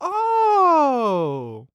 Human Male Ooh

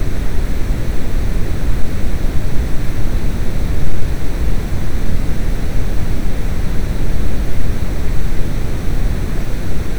Brown Noise Spatial Stereo

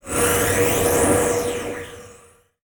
Transition Laser Distorted; Production Element

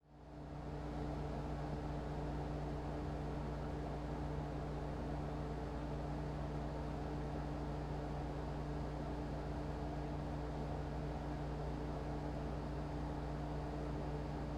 Ambience Air Conditioner; AdobeCC

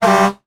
Horn - Air Horn From Fire Truck Quick; Emergency; AdobeCC

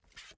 Technology CD Removal From Plastic CD Case

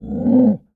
Animal Mammal Carnivore Cat Puma Growl; animals; AdobeCC

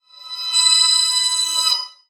Transition Musical Tone Whoosh; Production Element

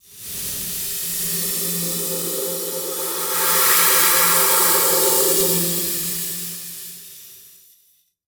Industry Tool Air Duster Spray Hollow

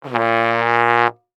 Cartoon Trombone Deep Note; AdobeCC

